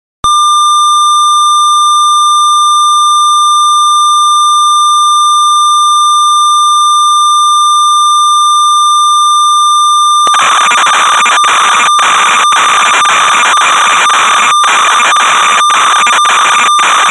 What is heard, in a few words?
Oberheim matrix 6 factory presets; Synthesizer Factory Patches; Cassette Interface